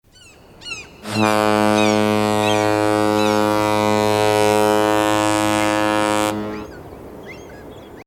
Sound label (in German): Artania Schiffshorn Klingelton; kreuzfahrt schiffe